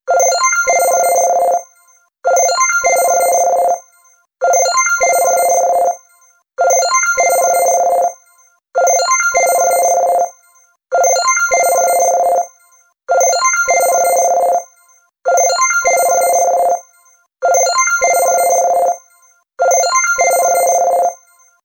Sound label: Soft Tone, ringtones; SU870 Optimus 3D Cube; LG